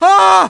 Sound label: Voices Victims - PAIN